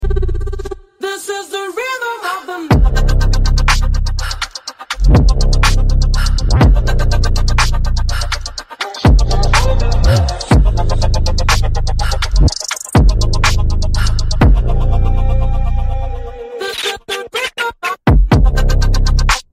slowed remix

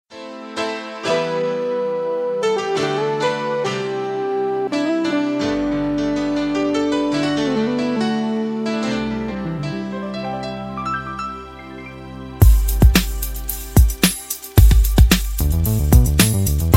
instrumental

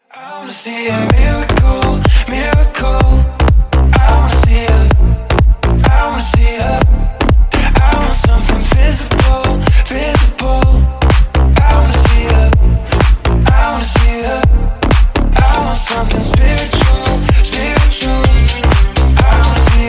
electro

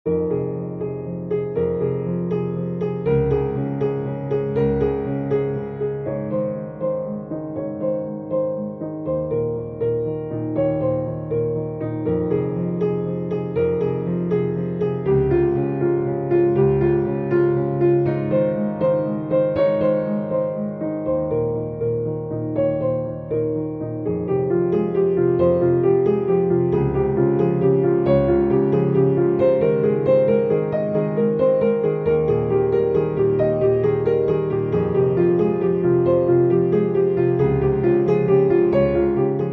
piano

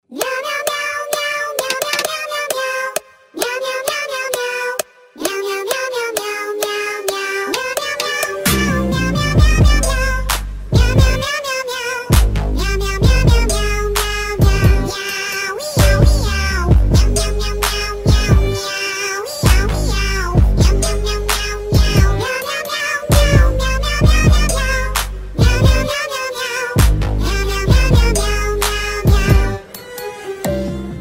bongo cat cover

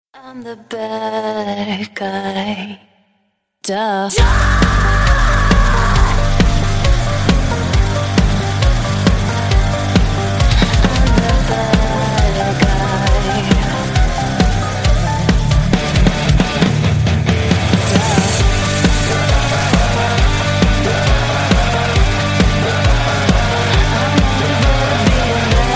metal cover

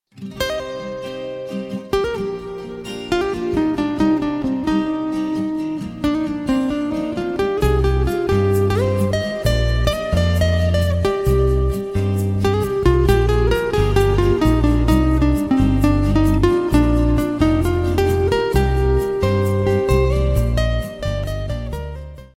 instrumental

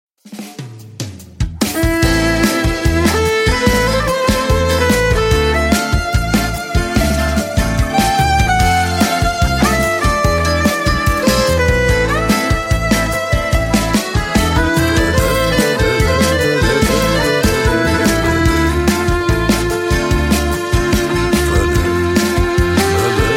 instrumental

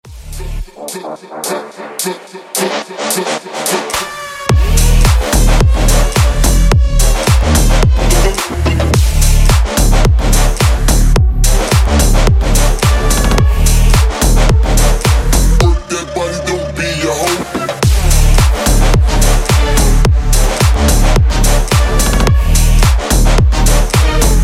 bassline

